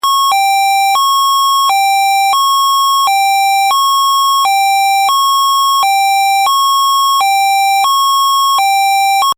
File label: xcel hi lo